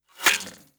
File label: Glitch, noise